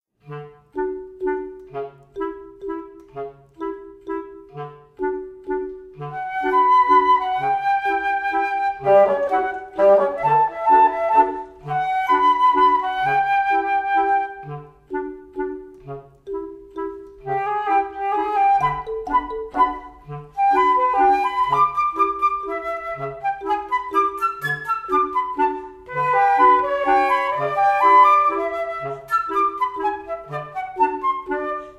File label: Waltz